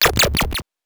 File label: randomize, 8 bits; Game Sound Solutions - 8 bits Elements